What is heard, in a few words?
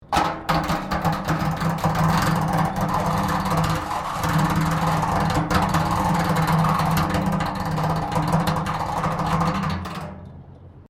METAL BIN SCRAPE WIRE FENCE